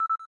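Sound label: camera timer last; Rollable LM R910N; ui; LG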